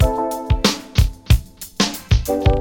92 bpm high quality breakbeat sample A key, kick tuned to the A note